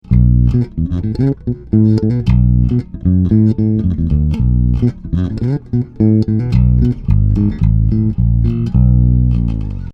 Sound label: Warwick